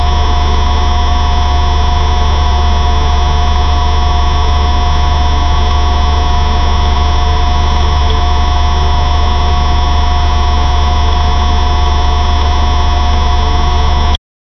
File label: 2jz engine sound